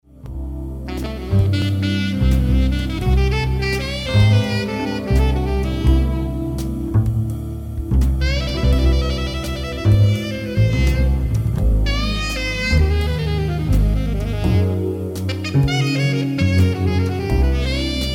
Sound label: Song Alto; ARB metal